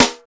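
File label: FUNCTION snare, drums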